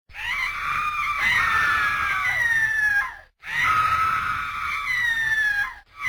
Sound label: female scream